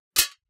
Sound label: plates hit; Kenney's Sound Pack; Foley Sounds; Plating